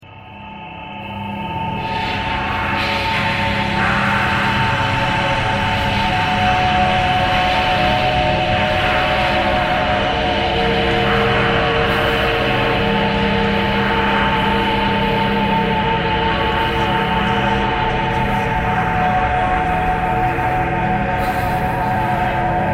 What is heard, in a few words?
traffic core birds thread harmonic